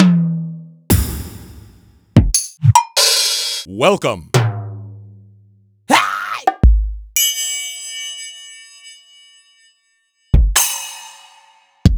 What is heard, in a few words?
Roland SPD-S; Sliced; m8